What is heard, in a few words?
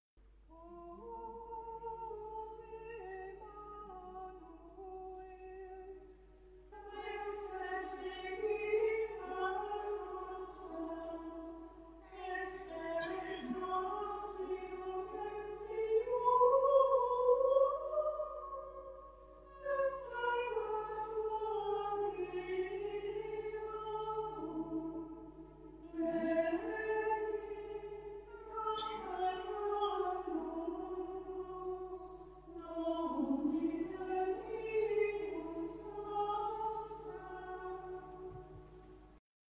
Antiphon O Emmanuel